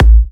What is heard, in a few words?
Kicks A; markus hakala